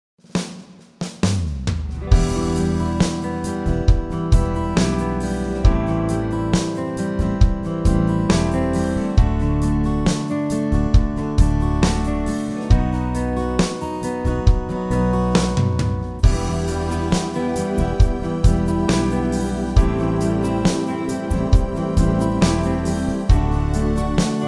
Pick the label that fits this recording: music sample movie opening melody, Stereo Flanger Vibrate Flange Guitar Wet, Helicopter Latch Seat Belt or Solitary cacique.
music sample movie opening melody